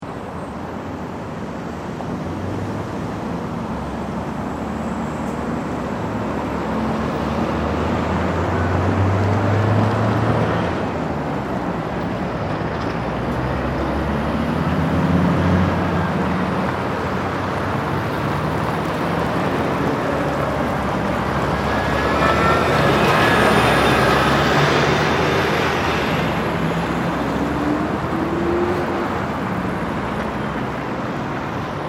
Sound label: Heavy traffic, mostly big trucks passing on big street. Car honk; Exhahust system from truck.; Car honking; Traffic And Car Sound Effects